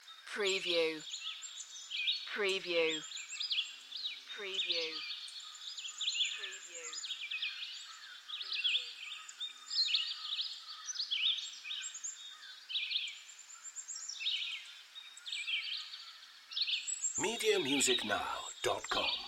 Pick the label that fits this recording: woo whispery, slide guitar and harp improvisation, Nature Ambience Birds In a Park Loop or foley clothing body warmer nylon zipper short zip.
Nature Ambience Birds In a Park Loop